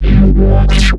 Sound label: Neuro, bass